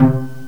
PizzicatoStr, Strings & Orchestra; GMPlay

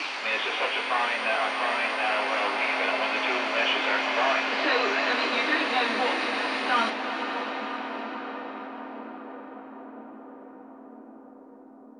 AMBNT 160; eJay; Ambient

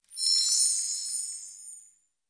shimmer

